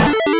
spawn; Techmino

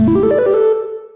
spin

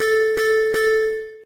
blip; Techmino

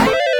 spawn; Techmino

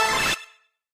spawn; Techmino

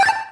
reach, chiptune; Techmino

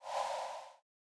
swipe; Techmino

